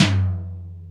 Toms, Real

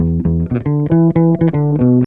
bass, Loops Phonky

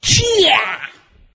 sheyeah; ooh ahh

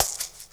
Shaker

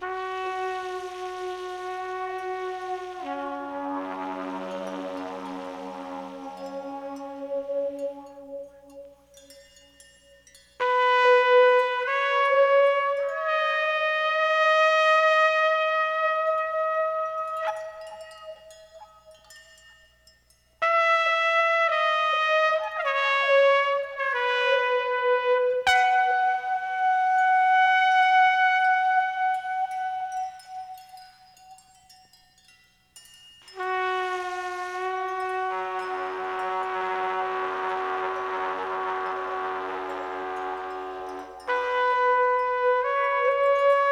trumpet

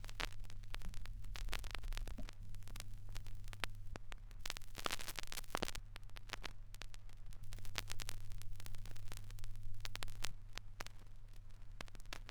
crackles, Vinyl

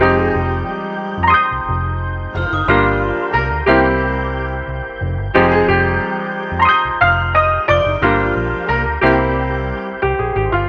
piano, Loops; Phonky

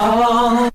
"oh" sung

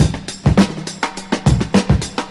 Drum loops, Boombap

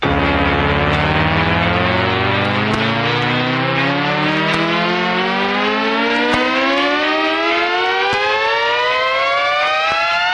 uprising; Zaps and Beeps

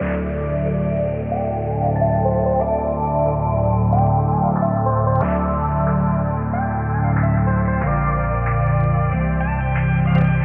keys, phonky loops

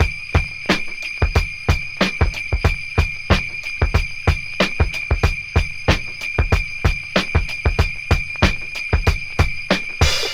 sleigh bells ring, Funky Drum loops

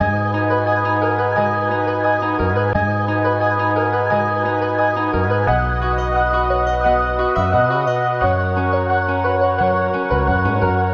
keys, jazz loops

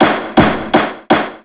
hammering

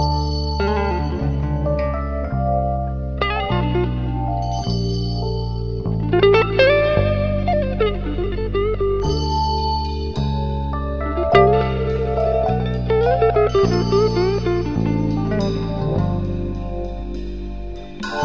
guitar heart-breaking man; Loops; Phonky

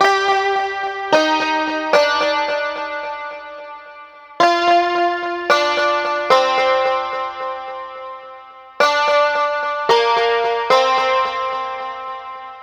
guitar, Jazzmatazz loops

